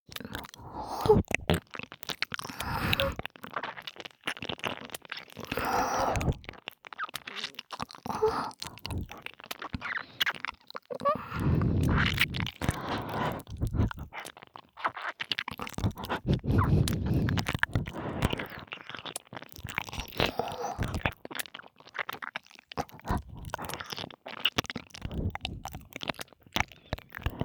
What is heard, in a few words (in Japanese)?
(右)ねっとりしゃぶりつき耳舐めエッチ, 黒耳 パンパン系効果音版; 舐め音比べ